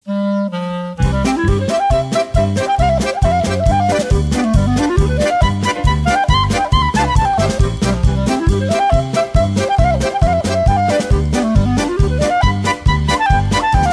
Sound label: Polka